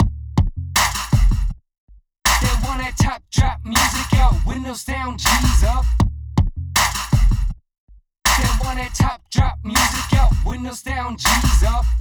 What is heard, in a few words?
Heavy Weight, free loops